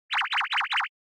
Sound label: Anime Motion, Mid; アニメモーション35-2(中)